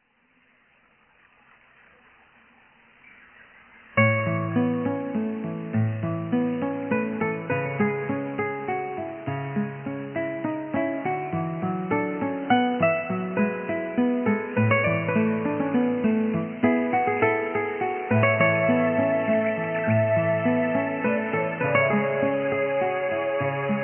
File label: Little Bird